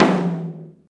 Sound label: tom lo, Alesis DM5